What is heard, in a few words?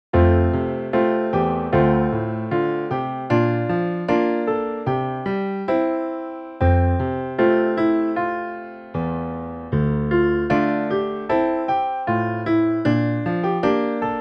Piano Only